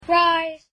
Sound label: rise